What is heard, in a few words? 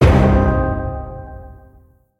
game lose; escape from frankensteins tower